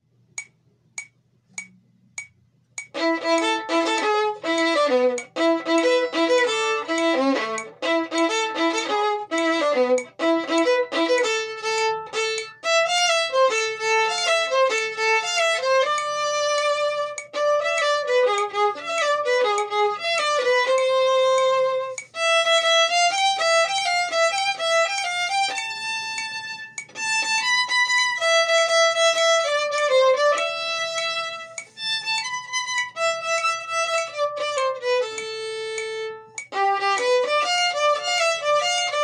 Cumbia Normal con metronomo

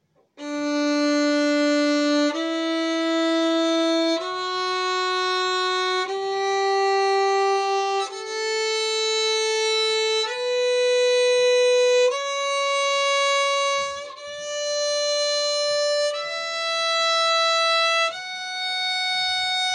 Escala de re mayor

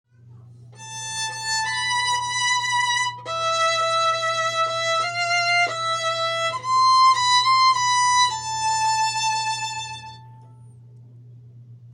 Extracto B lento, cumbia

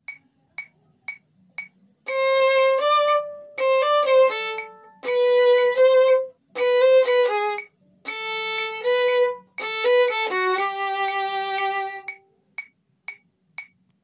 Extracto B normal con metronomo

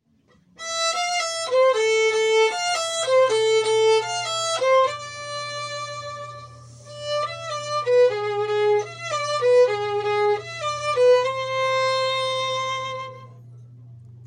Extracto A normal, cumbia

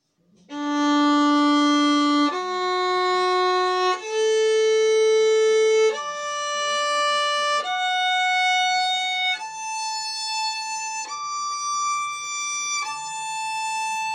Arpegio de re mayor

